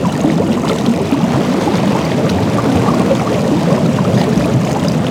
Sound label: Water Tank Loop; Warframe; Corpus Gas City; Ambience